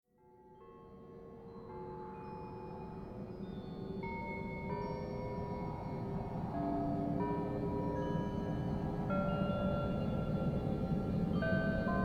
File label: bell world; electroluminescence